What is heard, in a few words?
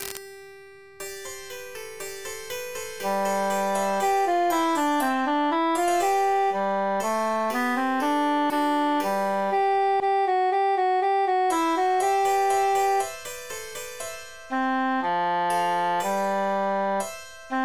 nightingale tenor emphasized